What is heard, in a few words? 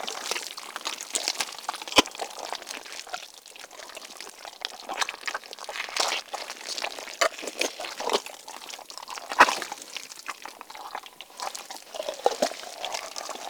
Infested Gore Loop Chunky Medium; Ambience; Props; Warframe; Infested Eris